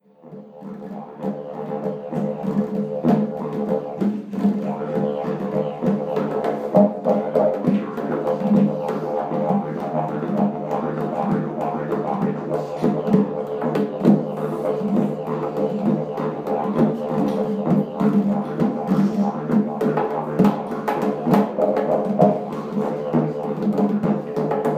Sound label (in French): didgeridoo violon; boeuf